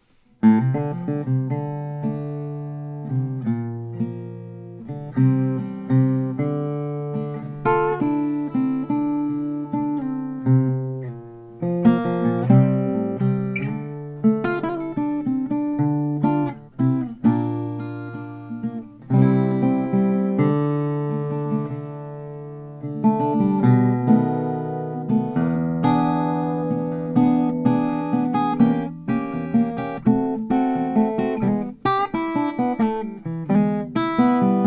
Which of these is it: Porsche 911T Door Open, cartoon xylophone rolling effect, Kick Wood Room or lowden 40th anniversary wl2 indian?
lowden 40th anniversary wl2 indian